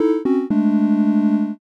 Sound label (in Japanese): zetsubou